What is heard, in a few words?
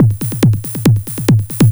SF Electronic Drum